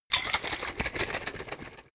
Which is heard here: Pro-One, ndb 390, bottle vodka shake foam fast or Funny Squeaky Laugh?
bottle vodka shake foam fast